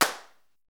PRC XCLAP, Hand Claps; Club-50 - Foundations Roland; Snaps

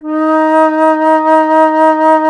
Alto Flute, Solo Instruments

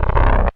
BLURP LOW, Hard & Loud Techno

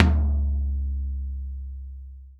TOM, Real Drum Kit, FUNK KIT

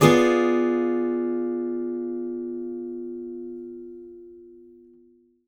ACCGTR DIMCD, Whole Lotta Country

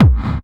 KICK hardcore, Techno Trance DRUMS

